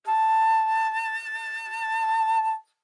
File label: Alto Flute vib ff A5 stereo; Woodwinds